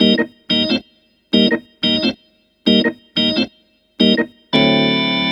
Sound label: Organ Lick